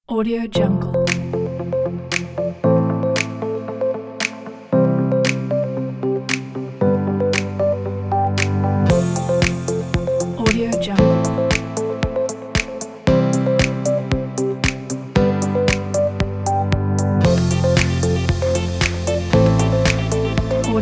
travel inspiring

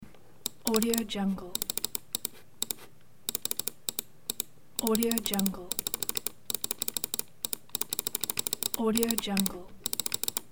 mouse clicks

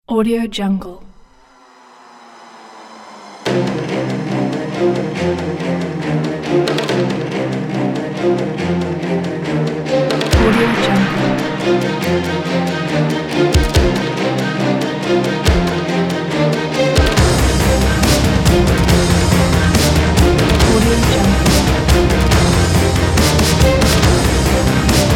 uplifting action trailer

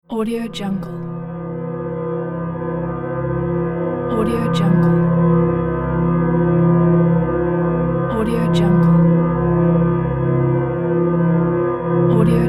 scifi space chamber

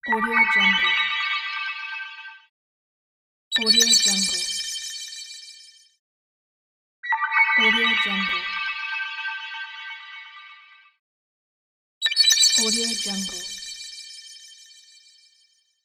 scifi interface menu; atmotronic

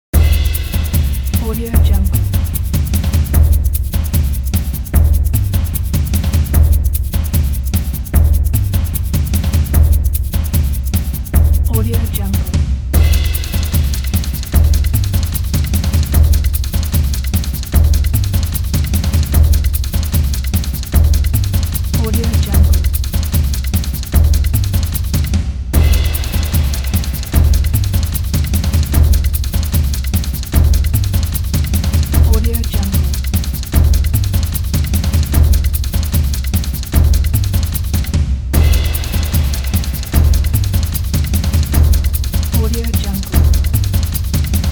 chase drums

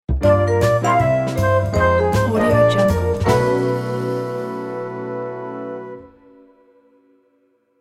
jazzy sitcom stinger transition

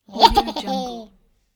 cartoon laugh

